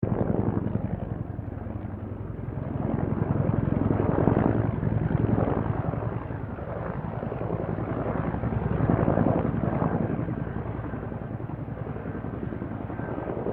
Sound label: helicopter AH 1 Huey Cobra overhead pass; Helicopters; Vehicles; Aircraft Collection